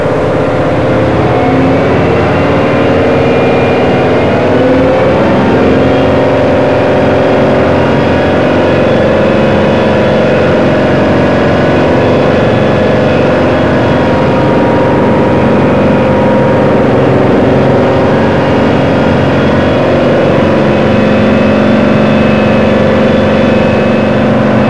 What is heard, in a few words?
motor, Train; OpenBVE; 55022 Royal Scots Grey